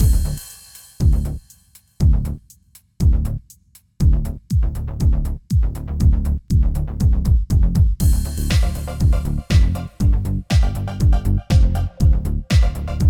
Breakout Mellow Mix Stem Layer; Breakout Recharged

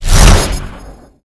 Pickups Powerups

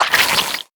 Warrior Acid Attack Double; we are the dwarves gamerip 2016

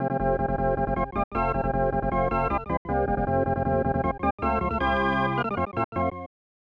Fanfare ~ Excitement; super bases loaded 3; snes

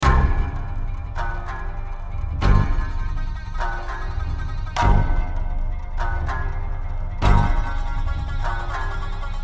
Music Theme; Four Weapons; lego ninjago rise of the snakes

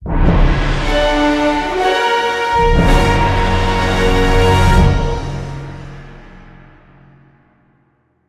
Menu Enter Lobby Intro; Fortnite

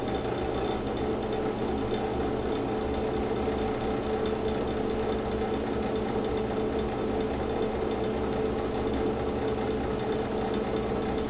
Fansound; five nights at freddy s fnaf 2

